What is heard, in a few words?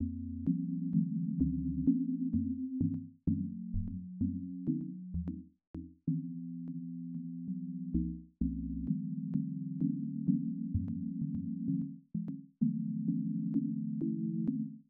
text to sound lowlow synth speed; hachures tourneurs